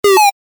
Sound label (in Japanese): バビュン レトロ